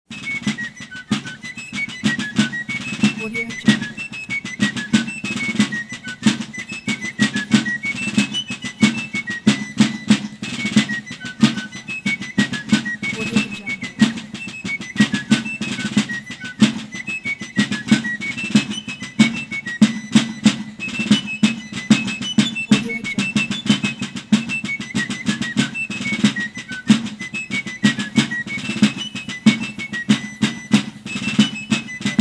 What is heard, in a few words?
colonial american fife drum musical transition; transitions and movement